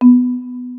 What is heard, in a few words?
rec stop; Handy